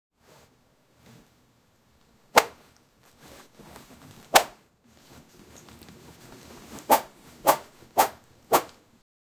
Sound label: bag flap sound